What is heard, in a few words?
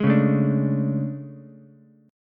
A Major 11th, down Ukulele C6; AM11; strummed; JGuitar